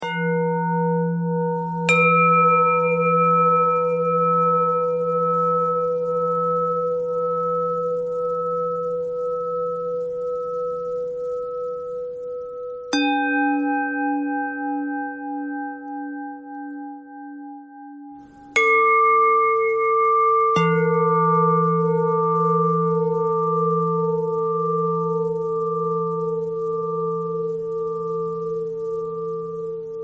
bell bend threads

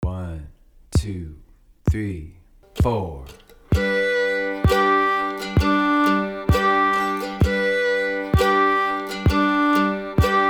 practice loops first bar loop

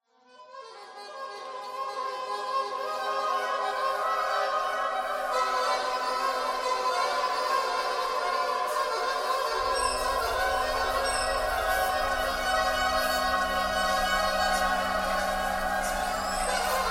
clarinet jazz thread noise; clarinet geese

